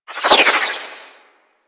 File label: Arch Wing Prime Dodge Roll Left; Warframe; Vehicles